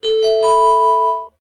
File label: clean, messagebox; SKY IM-A760S-VEGA-Racer